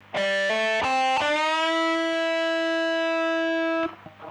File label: riff